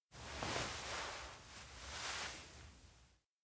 head pat; jet; anemoi パッケージ版 1.1

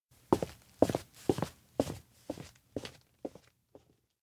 steps outdoor town leave; fumiya; anemoi

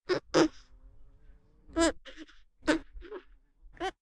baby voice; anemoi

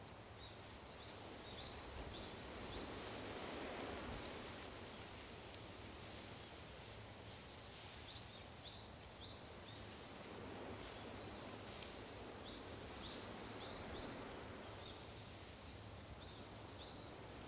outdoor observatory morning

